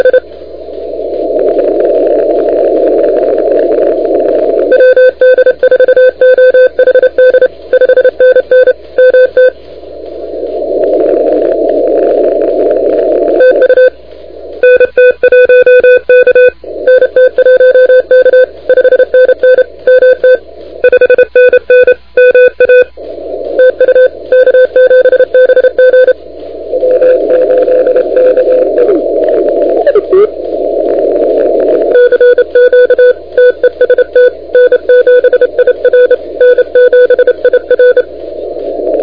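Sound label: continuous wave 28MHz; ARRL 10M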